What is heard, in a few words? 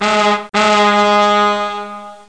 TRUCK; BOOST; ignition; PC Gamer 48